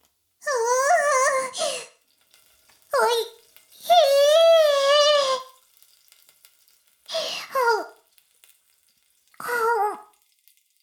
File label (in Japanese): フェラ音声; 古き城のドラゴニート2